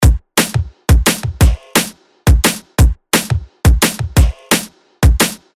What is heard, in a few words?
memories loop drums